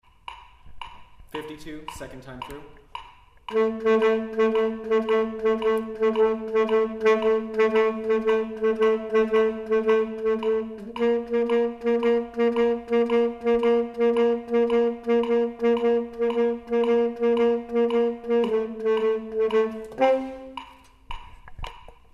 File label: Bayside Tenor